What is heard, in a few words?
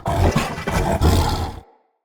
Corrupted Battle Dog Attack Breathe Snarl Active Walk, Animals, Vocals